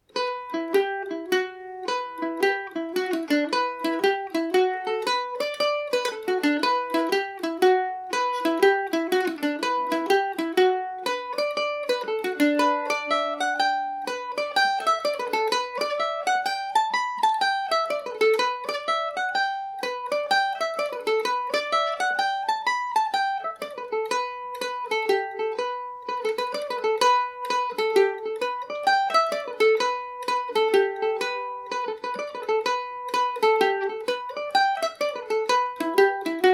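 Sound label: E minor, Irish mandolin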